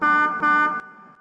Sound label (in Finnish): aanitorvi